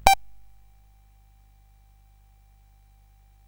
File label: sid CSG8580R5; Jumpman Junior